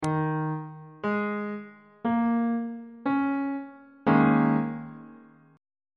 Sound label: d sharp dominant 7th suspended 4th chord on bass clef